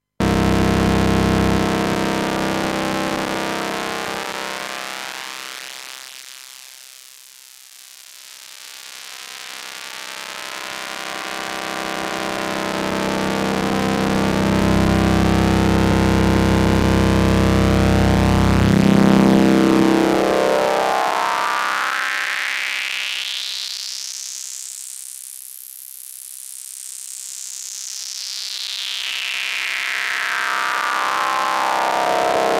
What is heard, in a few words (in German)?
Hi Pass Filterfahrt